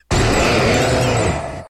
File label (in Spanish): Grito de Avalugg